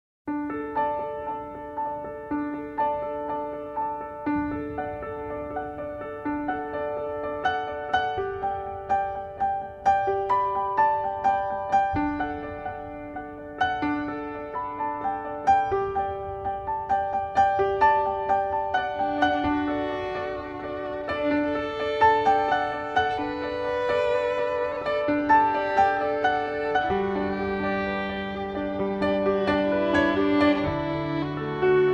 Enchantment (Duet Version)

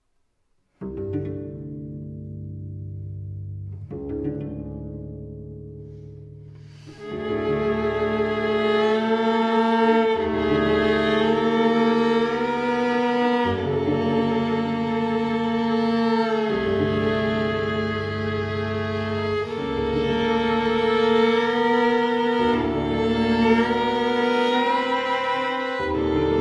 String Trio Lento; Op. 201 III.

